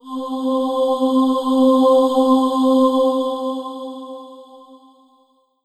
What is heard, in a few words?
Yamaha FS1r Spacy Aaah C4